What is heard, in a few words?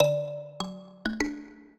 minuet, mbira; Mozart